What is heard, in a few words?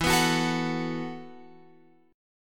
E Suspended 2nd Suspended 4th E, Guitar, Open F; Esus2sus4; strummed